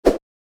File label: launch; phineas and ferb; snowbrawl